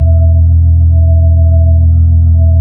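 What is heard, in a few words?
B-3 Brite, organ; Roland LCDP10 Keys of the 60s and 70s 2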